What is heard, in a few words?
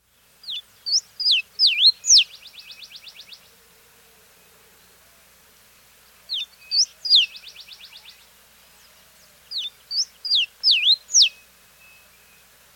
Black-Faced Grosbeak, Nature's Songs, S. America